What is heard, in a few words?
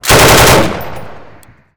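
exterior outgoing chain gun close burst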